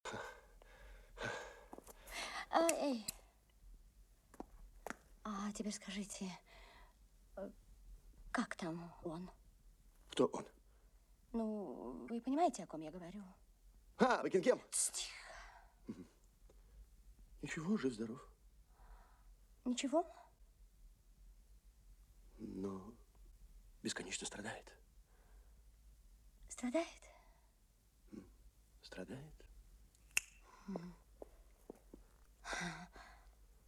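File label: endlessly suffering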